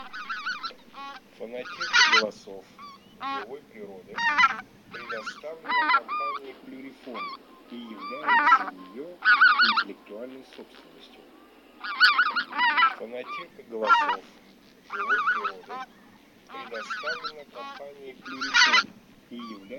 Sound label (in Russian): Белолобый базар Oche Lombardelle